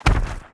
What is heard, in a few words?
Mammoth Run; Seer; GamigoNA